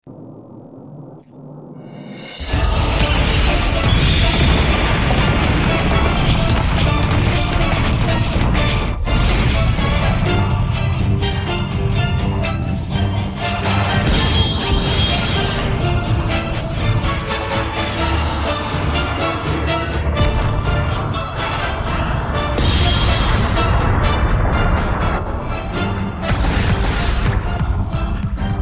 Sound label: instrumental maybe